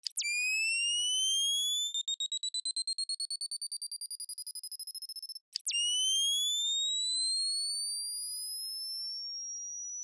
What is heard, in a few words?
Beeptimer Bomb Activation Time Bomb Beep And Click Whining Signal